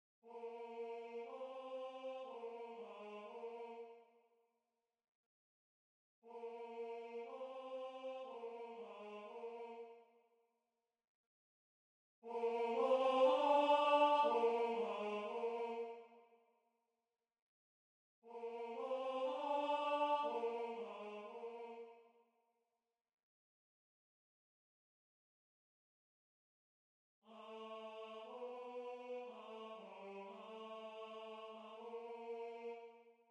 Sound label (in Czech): tenor; faure3 sanctus tenor1